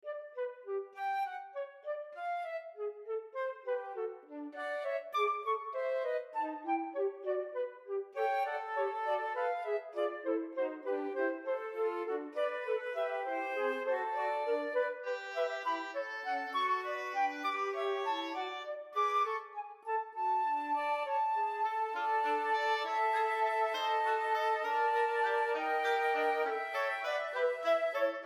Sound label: GIGUE